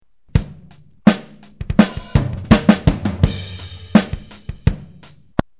drums

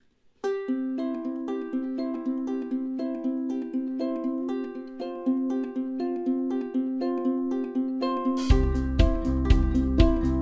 uke song

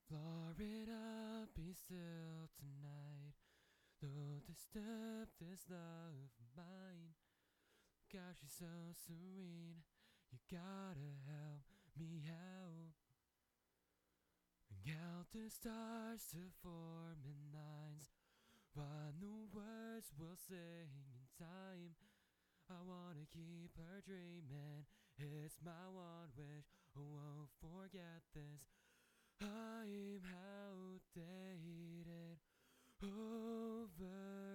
vocal track static

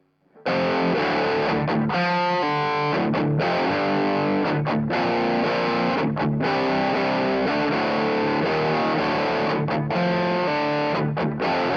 wider mic

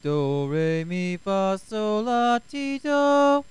do re mi desktop; Saffire Pro; Lenovo ThinkPad W510

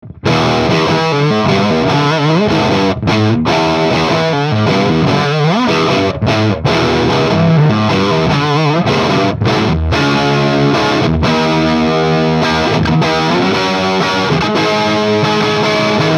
greenbacks jmp sm57

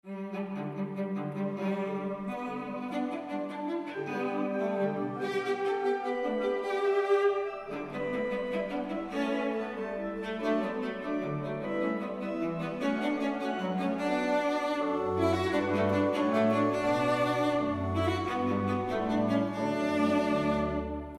suite tromba; cello; Strange recorded sound of cello; Couperin

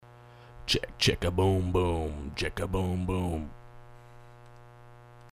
checka boom

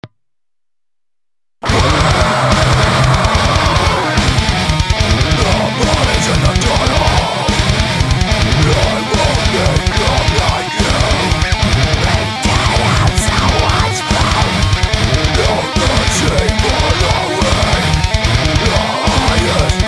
sample full mix, neo classical shred metal

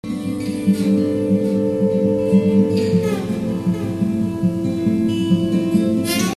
ebow

